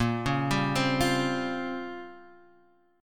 A sharp Minor 6th Add 9th A sharp down Guitar Half Step Down, chord; A#m6add9